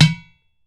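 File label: UDU FINGER, African Perc; E-MU Formula 4000 Series Vol. 4; Earth Tones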